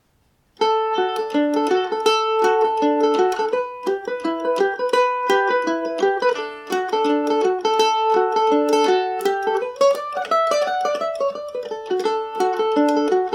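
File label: john crossey D major, mandolin; G&O #34 mandolin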